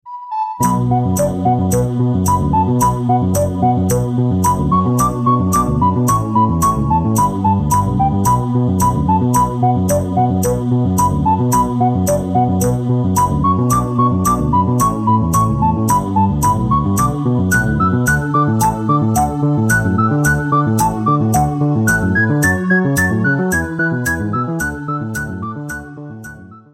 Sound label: Pop Corn Samba